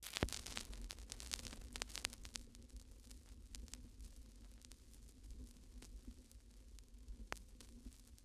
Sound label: Vinyl Crackles